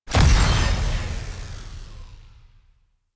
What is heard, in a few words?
Energy Ball Explosion Burst; Mine Osprey